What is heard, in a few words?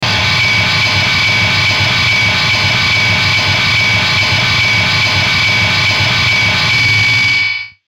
Feedback Rhythmic Distortion Hits Mechanical, Feedback Crystal Element Swell Swirl; Feedback Sound Effects